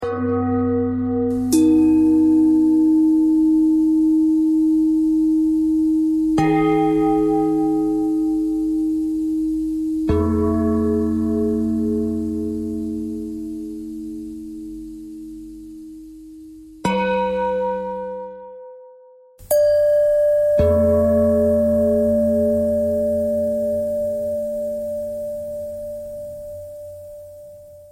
bell bell thread noise

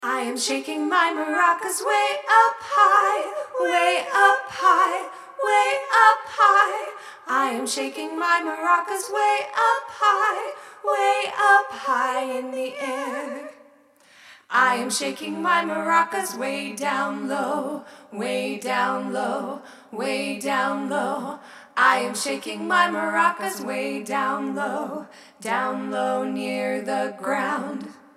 Maracas Hi Lo Scale; Julie Halitzka

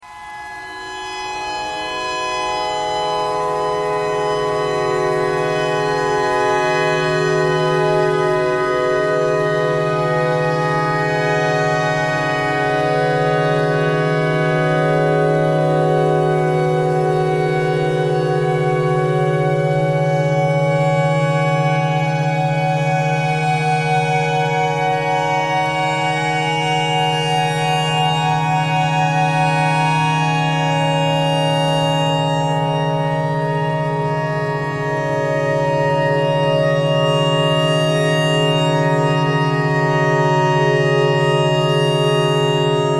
clarinet notes e tornado siren thread pentatonic

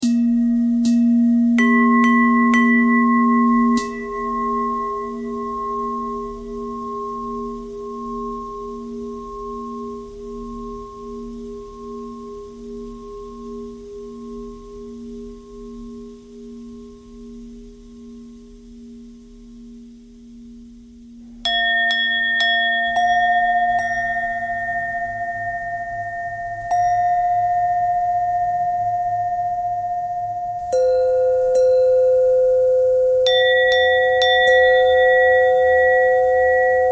bell bell thread noise

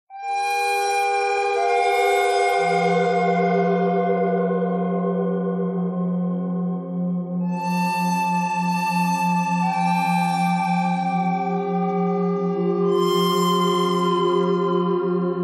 bird thread buzz; noreverb

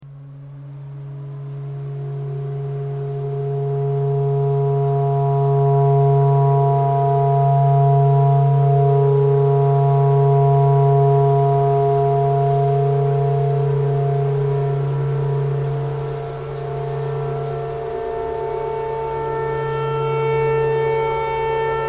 tornado siren clarinet thread pentatonic

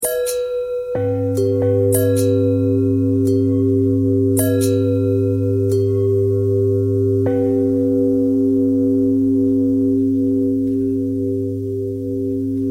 long bell bell threads, bells

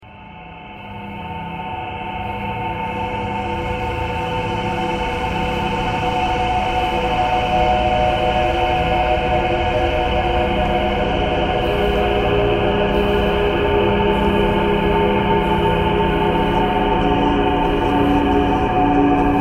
traffic core birds thread noise

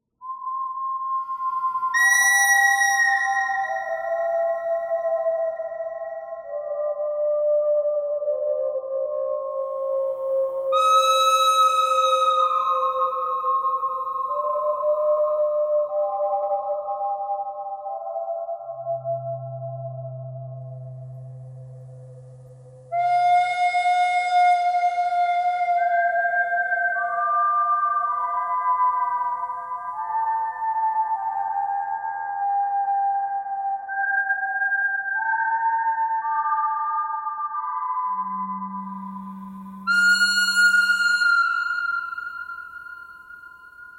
bird cry bird theme pentatonic

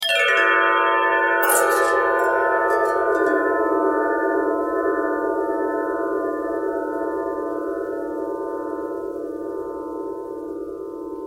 longbell bell bendtwist

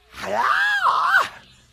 ghhaaarghaah

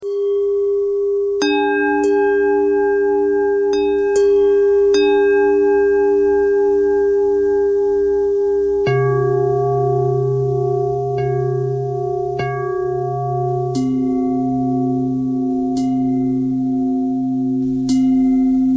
bell bend threads

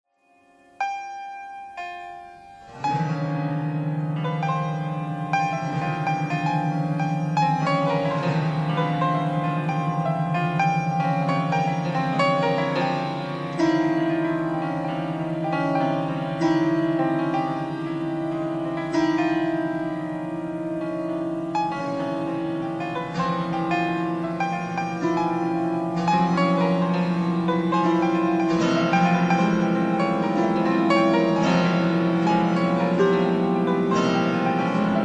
piano thread noise

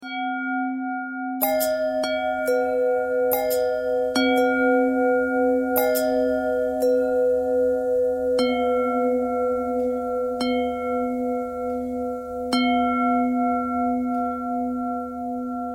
long bell bell bend threads; bells

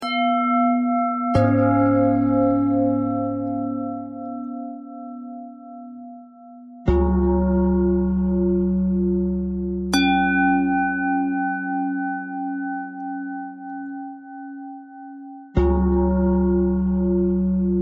bell bell thread simple, bells

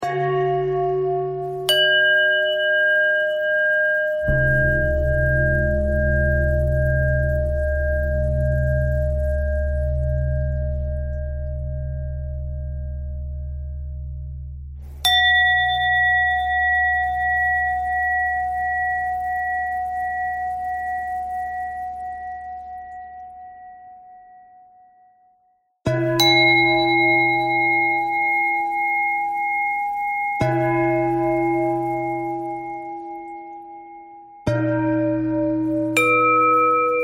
bell threads

